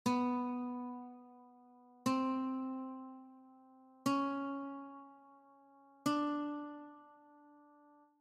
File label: B string, guitar; childrens